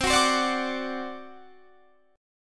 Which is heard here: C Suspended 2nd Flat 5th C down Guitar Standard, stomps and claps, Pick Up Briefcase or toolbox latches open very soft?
C Suspended 2nd Flat 5th C down Guitar Standard